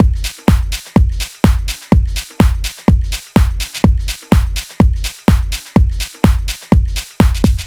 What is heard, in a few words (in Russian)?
Kicker B, диско хаус